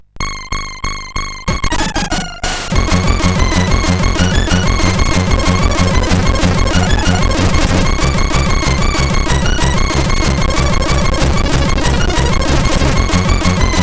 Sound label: Humppa, sid CSG8580R5